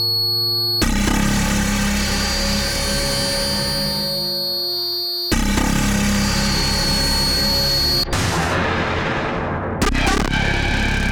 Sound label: multiband version; Ravager